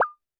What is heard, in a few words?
percussion, Roland TD-20